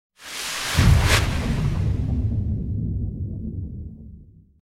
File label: Water - Weird whipped splash; Hollywood Edge Sound Designers Tool Kit